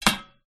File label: household metal incense burner place down